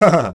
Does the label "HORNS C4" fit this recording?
No